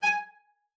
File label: G#5, CELLO SPICC; Xsample VOL-5